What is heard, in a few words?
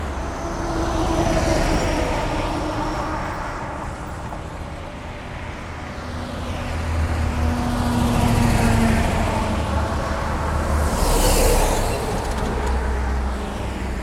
Meda Milano Ambience Ext Italy Traffic Passing By; Phonography; Holcim; Emanuele Costantini; Phonography Compendium 2